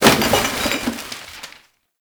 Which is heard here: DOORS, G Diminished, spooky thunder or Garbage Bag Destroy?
Garbage Bag Destroy